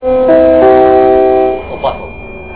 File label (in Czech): c prijezd, zvuky metro; Opatov